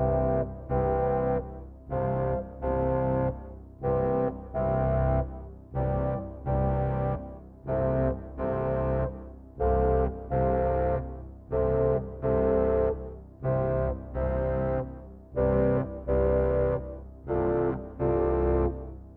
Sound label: midnight train